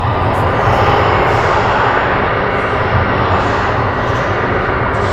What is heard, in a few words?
snake steam